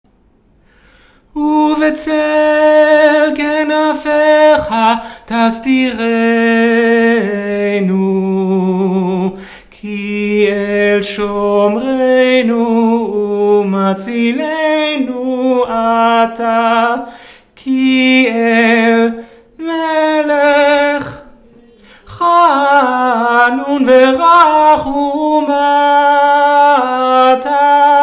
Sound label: tenor; Uv'tzel